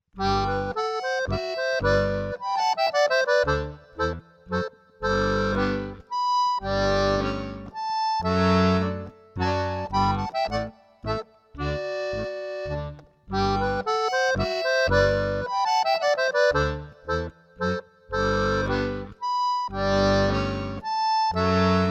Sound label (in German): Klassik